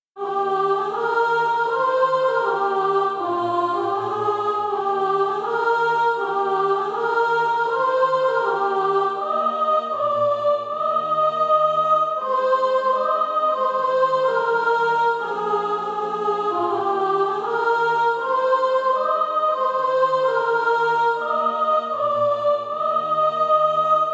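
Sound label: sop, Practise Music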